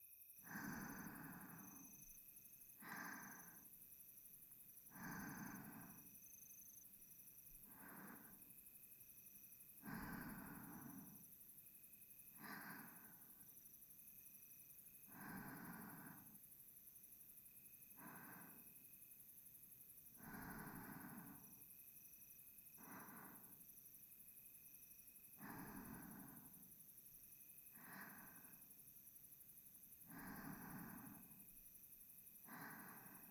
寝息 反転

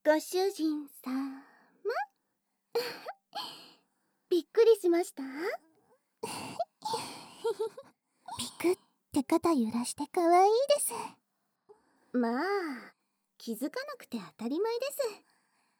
吐息＆喘ぎ声のみ, ASMR; 効果音無し; イチャイチャ&ディープキスでオナニーサポート

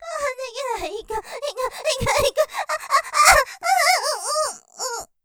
絶頂スパート 我慢できない！イクイクイク！！あぁ！！

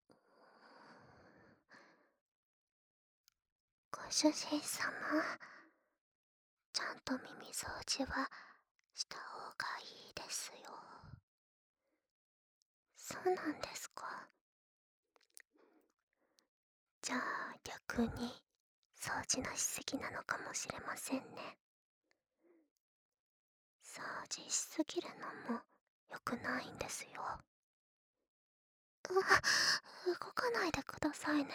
耳かき, SEなし; ご主人様のために、耳かきしちゃいます; まったり彼女のデートプラン!

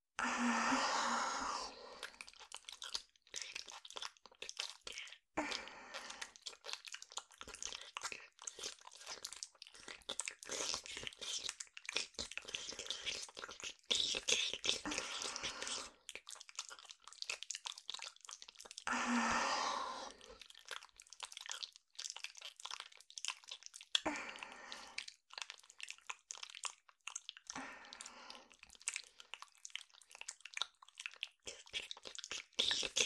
乳首舐め（両） 舐め音のみ

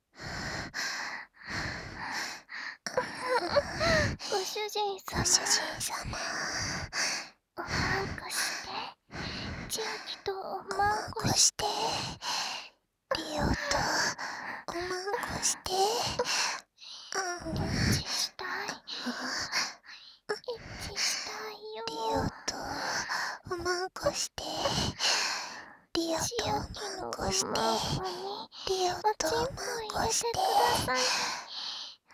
エッチしよ 喘ぎ, バイノーラル; ハーレムオナサポ 4人のメイドがご主人様のオナニーをお手伝いするバイノーラル～文、千曜、名月、莉桜～

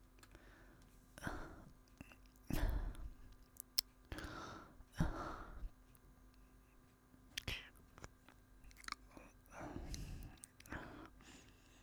ハァハァ L

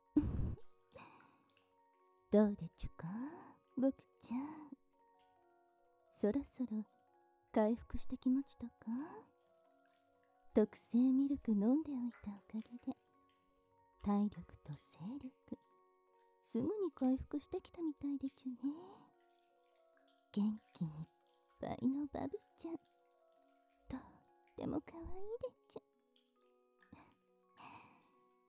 ローションヌルヌル逆アナルセックス; スーパーあまえんぼうタイム; 初来園ver

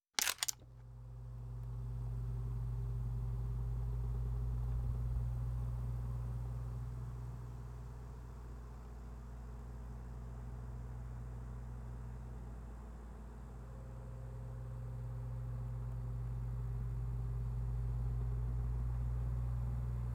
ASMR 宿と雷雨と扇風機

